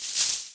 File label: Herb Shake; Restaurant; Act Kitchen